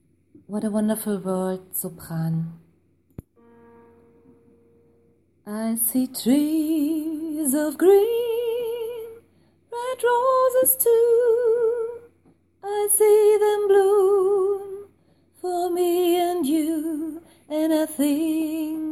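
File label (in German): sopran, gospel choir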